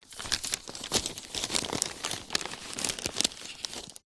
household magazine thin freebie light movement rustle; zapsplat